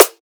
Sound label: snare, drum hits; Virtual Riot; supermini